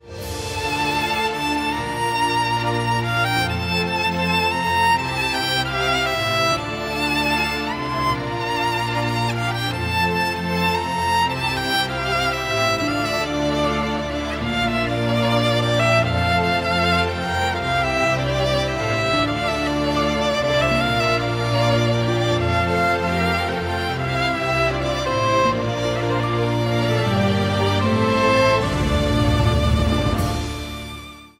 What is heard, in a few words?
Instrumental